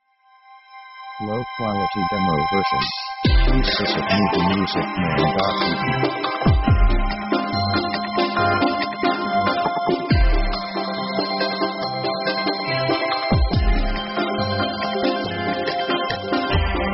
pop cheap; easy themes

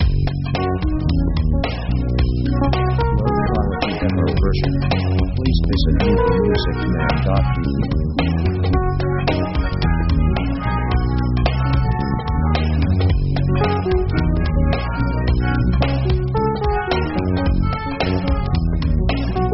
Jazz; synth themes

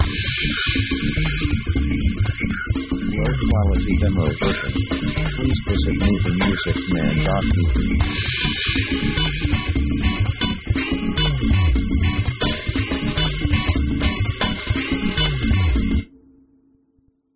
jazz dance

